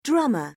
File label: drummer; Oxford Word Skills Intermediate